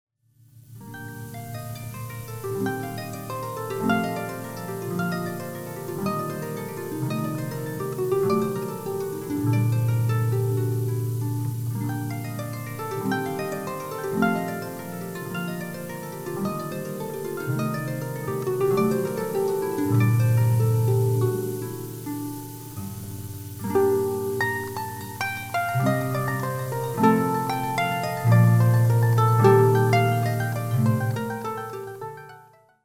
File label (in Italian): ARPA; arpa per matrimonio